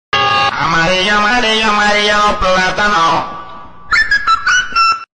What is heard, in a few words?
ambulance; monke